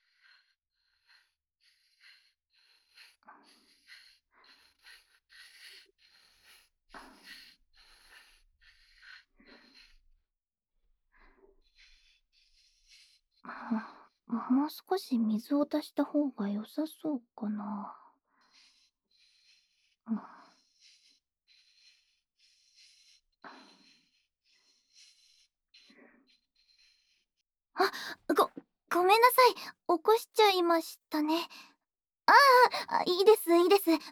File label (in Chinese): 研墨声&练字, ASMR